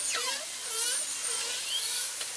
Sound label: QUAD BUZZ, beluga